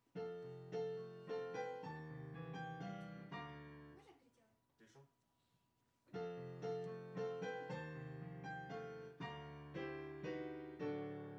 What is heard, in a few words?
Viola D